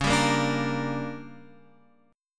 D Major 7th Suspended 4th Sharp 5th

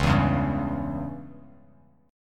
C sharp Diminished C sharp, Bass Standard; C#dim strummed; JGuitar

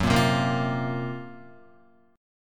F sharp Major 7th Flat 5th, Guitar; F#M7b5 strummed

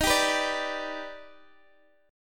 Eb Augmented Major 7th Eb, Guitar Drop D; x,x,13,12,12,10; down

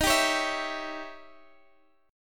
Eb 7th Flat 9th Eb

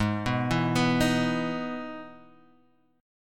E Major 7th Ab

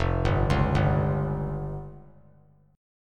F Suspended 2nd Sharp 5th F down; Fsus2#5 arpeggiated; JGuitar

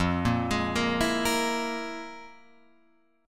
F Minor Major 7th Double Flat 5th, Guitar, Open F; FmM7bb5 arpeggiated; JGuitar

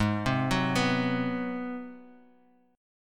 G sharp 7th Sharp 9th, Bass Standard; arpeggiated; 16,15,16,16; JGuitar

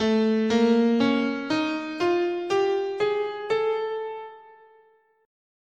A Enigmatic, scale

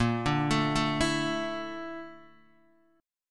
A sharp Major Sharp 11th, Guitar, Open D

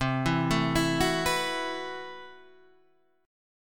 A Minor 6th Add 9th C, Chord

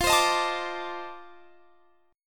F 13th, Guitar Standard; F13 strummed

